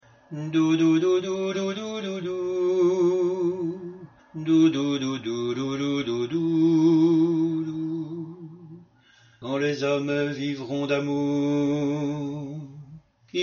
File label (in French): alto